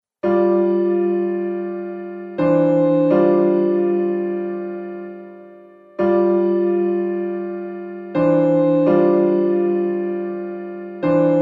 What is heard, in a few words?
Antiphon, Solo Piano